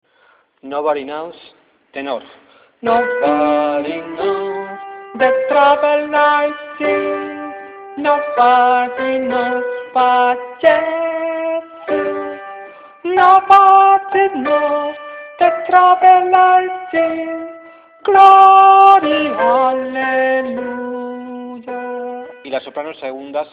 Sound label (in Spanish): tenores